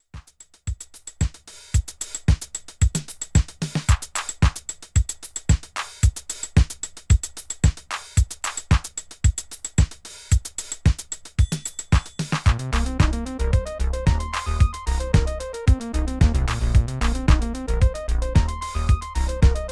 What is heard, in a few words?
basic rhythms